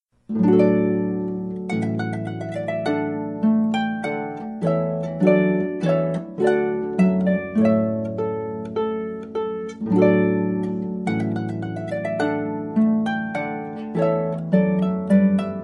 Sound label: trumpet voluntary